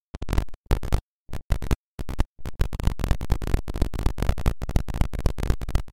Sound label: Cable; Cherricar Cable